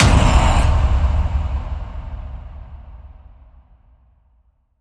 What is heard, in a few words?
Predator Gothic Hit Hyped